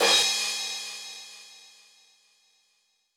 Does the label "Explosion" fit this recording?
No